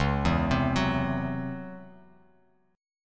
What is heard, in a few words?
Db Minor Major 7th Double Flat 5th Db down Bass Standard; DbmM7bb5 arpeggiated